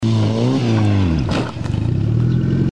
podezzhaet k podemu prokruchivaetsja kolesa i podnimaetsja

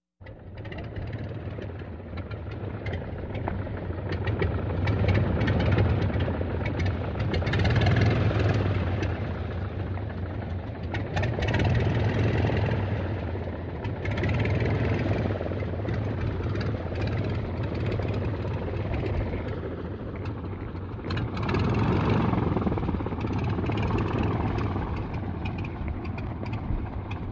Kukuruznik Propeller zvuk snaruzhi

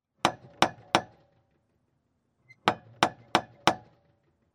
stuchat predmetom; Звук стука в дверь

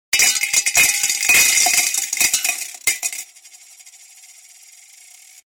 monety vysypajut v mednuju tarelku

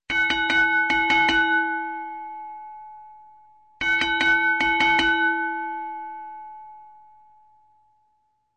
Kolokolcgik nizkogo zvuchaniya; Колокольчик низкий звук; Любой звук колокольчика, корабельного, музыкального, маленького, большого, одиночные удары

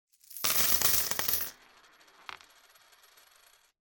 vysypali sranu neskolko melkih monet

